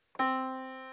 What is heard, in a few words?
yamaha Disk